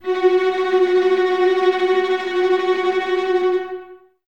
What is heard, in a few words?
VLS F# Tremolo, Violas, String Ensembles; Miroslav Vitous